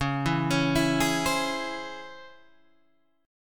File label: Db Minor Major 7th Db Guitar Open G